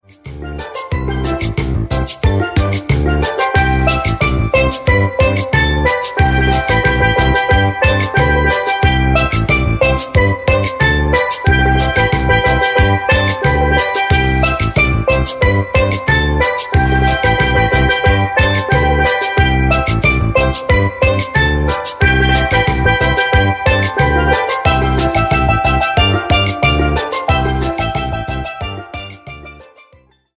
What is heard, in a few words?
Solo Steel Pan